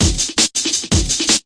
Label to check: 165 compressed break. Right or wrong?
Right